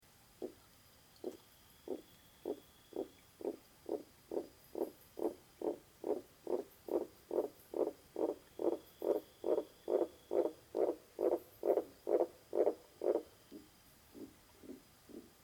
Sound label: Limnonectes Namiyei Is Calling